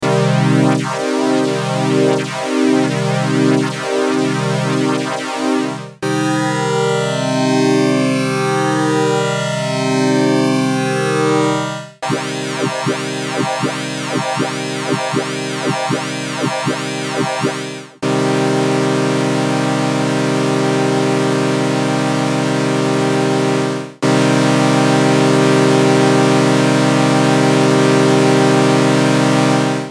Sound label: effect modules